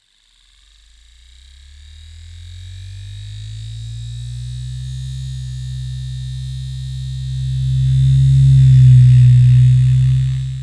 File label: Industry Motor Servo Spinning Weak Then Strong